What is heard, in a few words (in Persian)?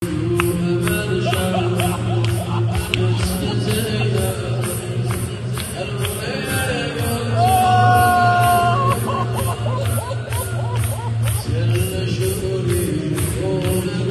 نوحه